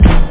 jungle

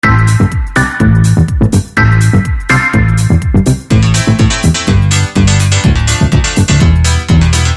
bas melody; Mirex; ukß╛ka zv²raznenej melodiky a basy

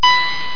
beep

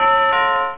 Carillon, Chimes Bells

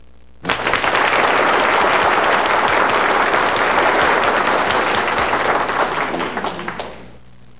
Applause; VGA Spectrum 2; Creative Voice

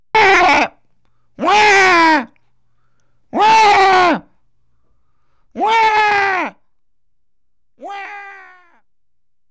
comic cry

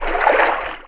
swim; SpecOps2 MPlayer Demo

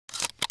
shotgun fill, weapons foley; Spearhead Demo

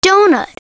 DOUGH

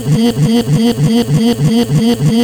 loop 196bpm

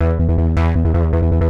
bass, 160bpm; hous-tec

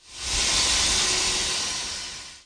steam; Kingpin

